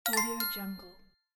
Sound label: correct